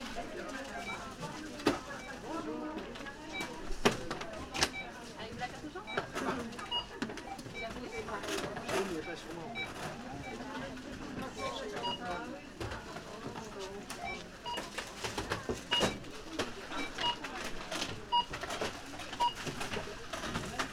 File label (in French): supermarche